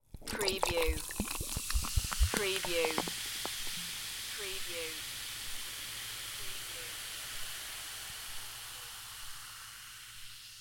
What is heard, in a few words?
Pour Soda Water, Fizz Of Carbonated Drink Into Glass With Ice Cubes